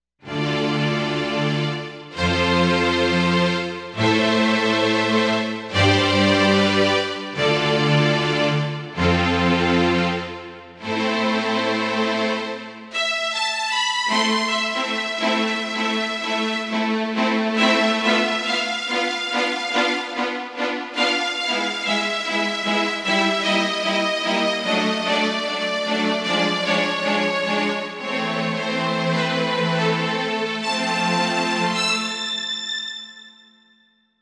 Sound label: The Strings